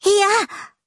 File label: damage